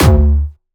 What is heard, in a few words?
D#2, Jumpstyle Kick; Bassdrums; Jumpstyle Multis; vengeance essential club sounds-3; VEC3 Bassdrums